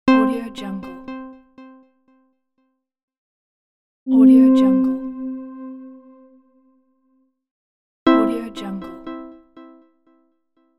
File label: game interface